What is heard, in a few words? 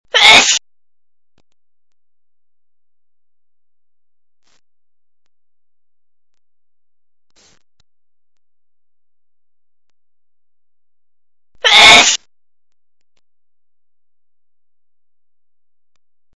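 sneeze, female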